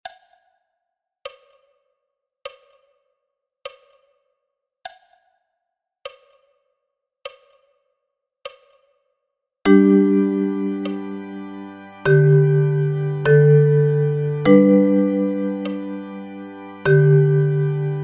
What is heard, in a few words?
Uke Bariton Sound kompl; Weihnachtslieder; Christmas is coming; play ukulele duets ukulele bariton ukulele